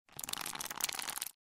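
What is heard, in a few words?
clenching fist; Сжимание или сжатие кулака от злости, обиды или ненависти для монтажа видео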